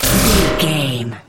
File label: sci-fi weapon blaster laser deep drone